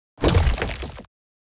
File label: building upgrade build